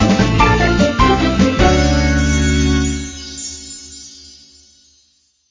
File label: bgm stage clear animation